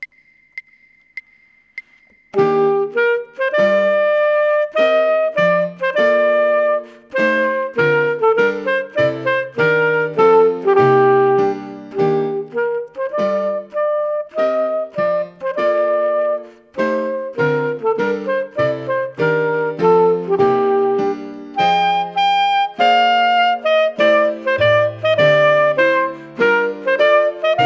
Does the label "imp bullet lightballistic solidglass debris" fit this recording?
No